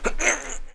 Climb; The damned; TR3; Playable Tutorial Level

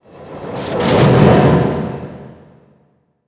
Minisub Clatter; Playable Tutorial Level; TR2

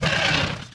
Crocodile Death; Playable Tutorial Level

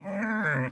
Warthog Grunt; Playable Tutorial Level

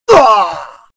Death

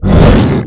Yeti Birdmonster Growl; Playable Tutorial Level; TR2

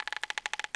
Rope Creak; Lara; Playable Tutorial Level

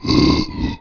Yeti Growl; Playable Tutorial Level; TR2

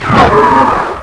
Giant Mutant Attack; Playable Tutorial Level; TR1

